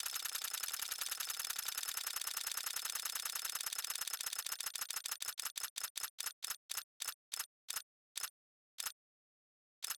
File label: upgrade tick